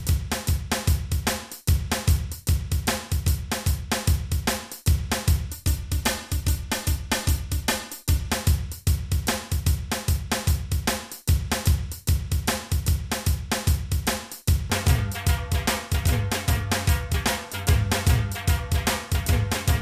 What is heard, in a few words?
dhol loop